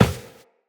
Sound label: Run Glass, Footsteps; Tenno